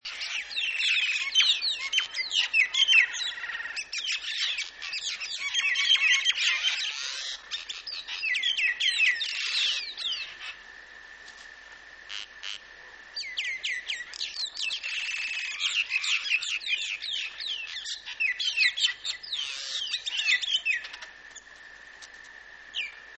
Mynah

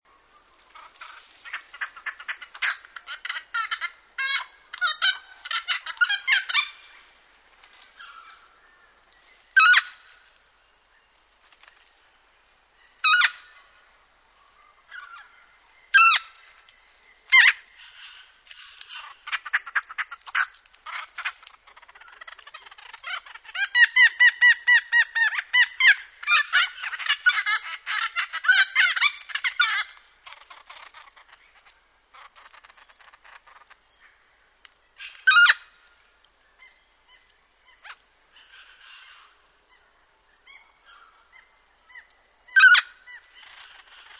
noisy friar bird

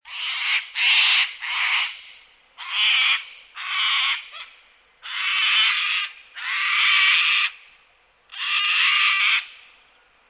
Sulpur crested cockatoo

